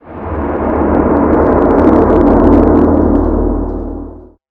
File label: powerup